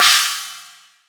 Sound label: Hats Real Open